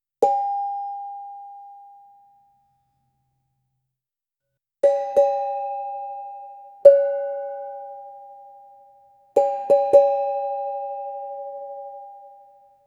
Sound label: bungkaka bamboo buzz